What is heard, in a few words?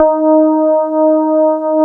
DX PIANO; Wizoo - Powered DX